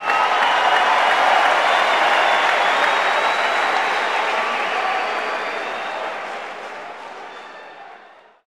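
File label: Basketball Crowd Cheer; Crowds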